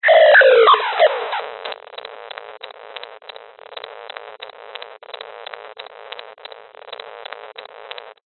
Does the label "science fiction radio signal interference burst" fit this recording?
Yes